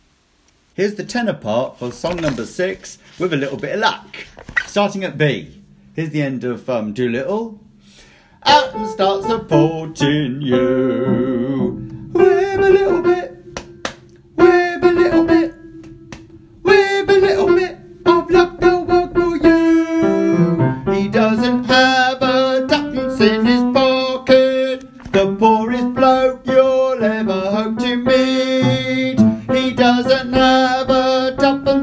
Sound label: Tenor part